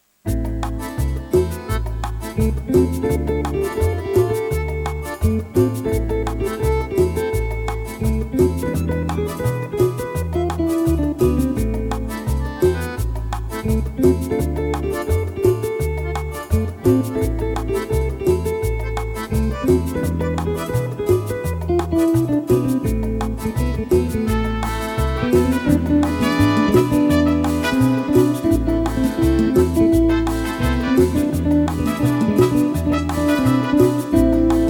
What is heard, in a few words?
Cumbia